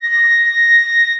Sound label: flute